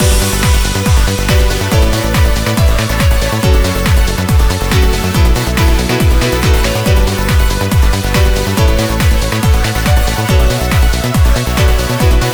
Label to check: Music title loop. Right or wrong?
Right